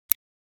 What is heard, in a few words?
Assault rifle fire selector